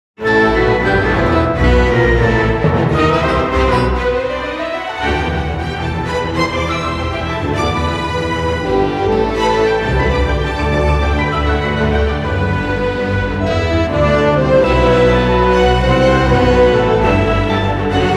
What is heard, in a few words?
Orchestral Arrangement; Fight'N Rage Original Soundtrack Gold Edition 2023